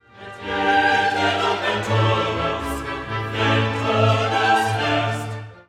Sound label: Credo Et iterum